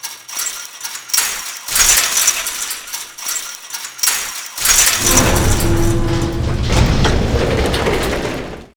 fence open; Modern Warfare

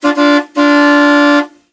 horn beep; Call of Duty Modern Warfare

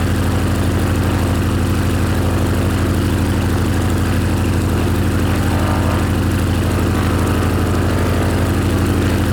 plane; World of Tanks

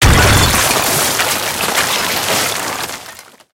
concrete crash

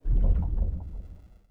cancel echo

